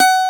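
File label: Steel Acoustic Guitar A3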